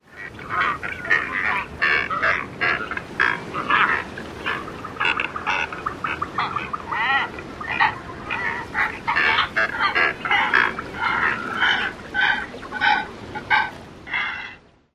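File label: Brandt's Cormorant colony; MEX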